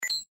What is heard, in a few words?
success; gmod; cracker